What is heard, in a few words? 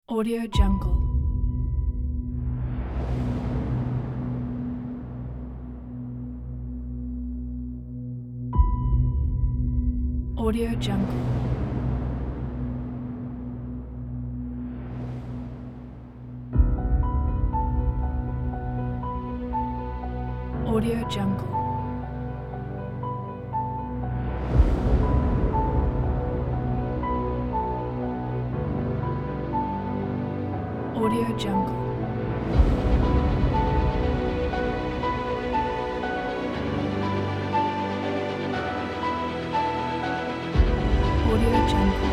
the storm epic movie trailer